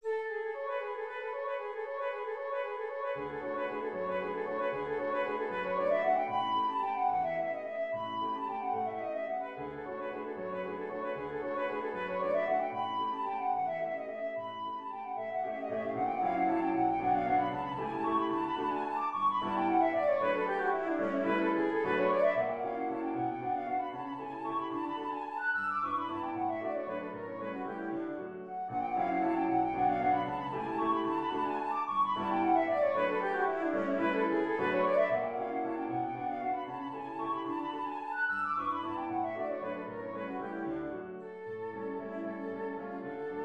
concerto per violino flauto pianoforte; Frédéric Chopin; valzer; trascrizione

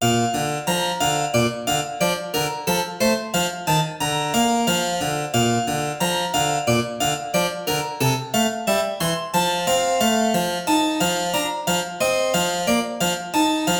allegro inglese; vanhal johann baptist

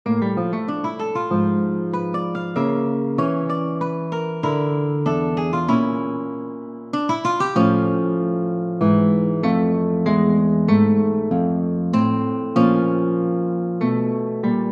chitarra

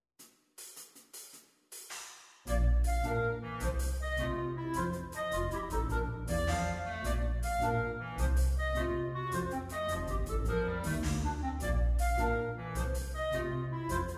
duetti progressivi

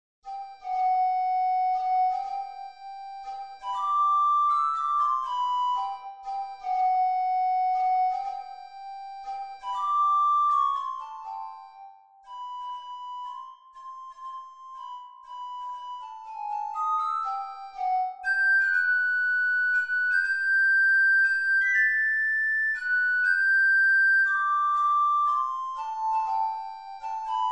menuetto; beethoven ludwig van

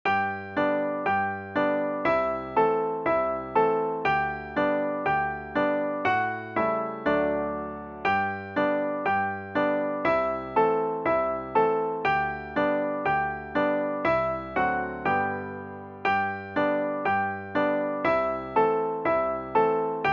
sonatina sol maggiore

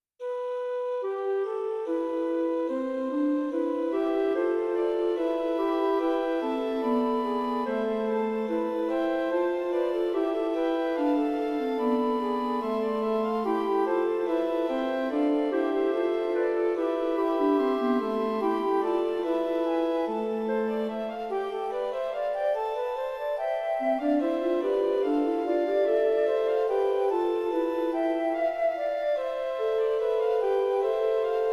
ricercar; Giovanni Bassano

